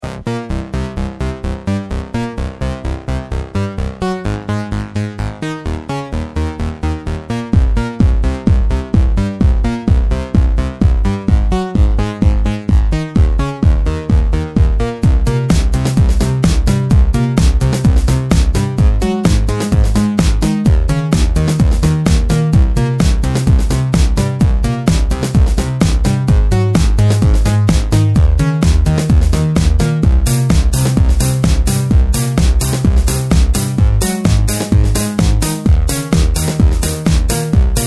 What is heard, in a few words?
ding dong ding dong; reason